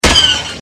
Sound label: fire, starfighter; lfs; naboo n1 starfighter